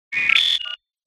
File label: Loading; PMSFX